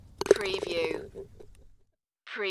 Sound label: Golf Ball In Hole